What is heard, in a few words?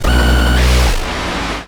Machine Buzz